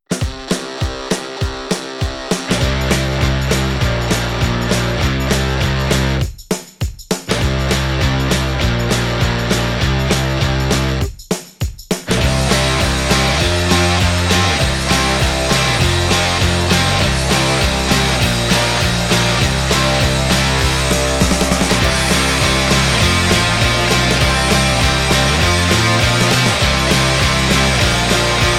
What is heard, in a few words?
Rock